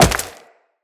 Wall Jump Rock; Tenno; Footsteps; Wall Run

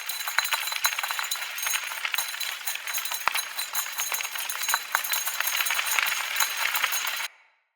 Energy Spike Wave Ball Rolling Loop; Enemy; Sinecure; Melee Grunt Dual Agent; Abilities

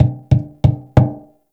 Finger Thump; Railjack Hunt

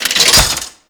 Mode Start Interact; Warframe; Nineteeen Ninety Nine; PvPvE

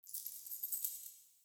Idle Head Shake; Tagfer; Warframe; Ambience; Entrati

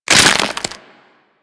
Stealth Neck Snap; Warframe; Characters; Tenno

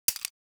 Mech, Weapons; Warframe; Tenno; Dual Soma

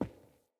Grunt Boot Walk Wood, Footsteps, Boots; Enemy; Sinecure; Melee Grunt

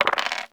Dice Roll One; Warframe; Koumei

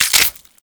Modular Clip Small Mech, Guns; SU Modular Guns

